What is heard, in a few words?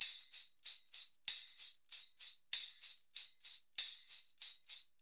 ER TAMB, LOOPS, Percussion; Sampleheads; NYC Percussionworks; ER LOOPS 96